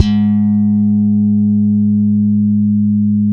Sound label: Bass, G#3, ALL THUMBS; Spectrasonics; Bass Legends